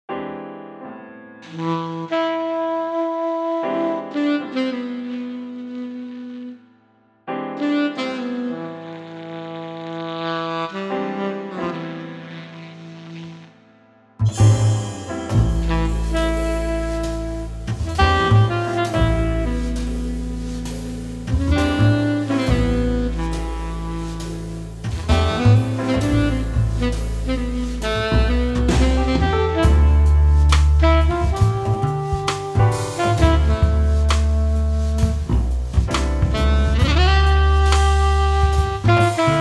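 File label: Alto Sax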